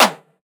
clap frown; Drum One Shots; SD MIDNIGHT Sample Pack; Slate; Claps

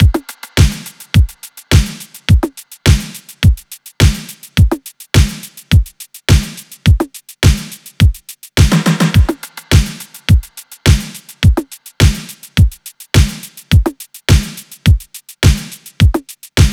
drums 105; Drum Loops; Full Drums; Quattro; Slate; MIDNIGHT Sample Pack

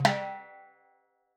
timbales Flams; Raw Perc One Shots; Slate; SD SEISMIC Drum Sample Pack

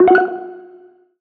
tonal oneshot F#; Pop; Slate; PASADENA Pop Sample Pack; Tonal One Shots

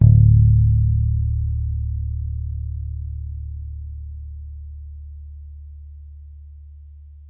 Bass Long Dark D, Electric Bass One Shots; Slate Digital BASSHOLE Sample Pack

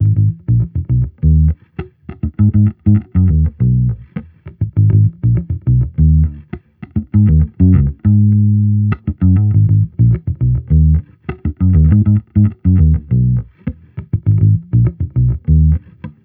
Amped loop 101 Abm, Electric Bass Loops; Slate Digital BASSHOLE Sample Pack

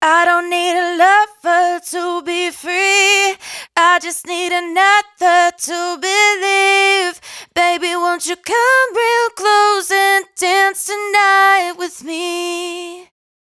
hook Dont Need 128 Fm, Dry Vocals; Raw Vocals; Slate Digital Omnivox Vocal Sample Pack

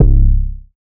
808 aero C, Tonal; Hip-Hop; Slate; CAICOS Hip-Hop Sample Pack

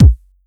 Kick, 909 Kicks

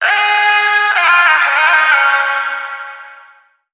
phrase Db, Vocal; Slate Digital Omnivox Vocal Sample Pack

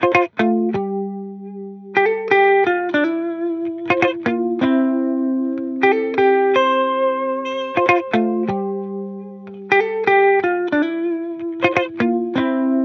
guitar loop 124 F; Pop; Guitar Stacks; SD PASADENA Pop Sample Pack; Slate

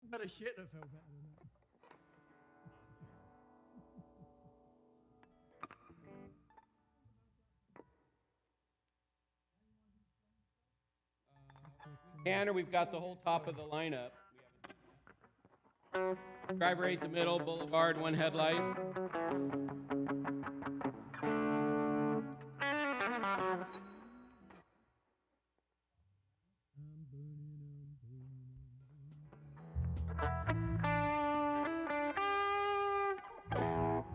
Vocal Emphasis Mix

